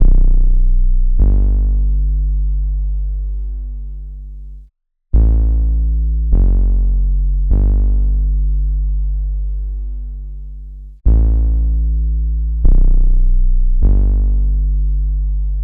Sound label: Synth Bass Bm 76, Lo-Fi; Melody Kits; Loops; Ghosthack; Gone